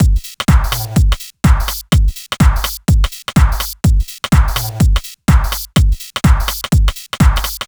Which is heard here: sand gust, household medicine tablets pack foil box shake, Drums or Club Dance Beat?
Club Dance Beat